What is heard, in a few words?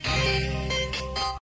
Vivo Y78T Notification Ringtone